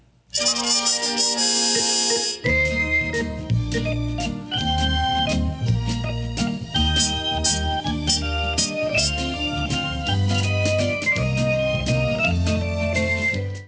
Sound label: hockey organ; bradybunch